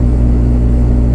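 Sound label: machine; quake2; mnhcoop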